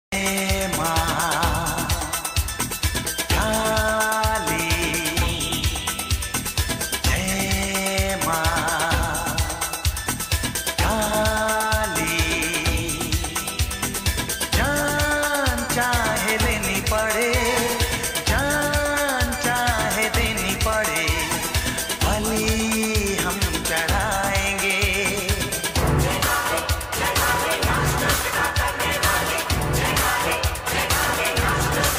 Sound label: jai maa kali song ringtone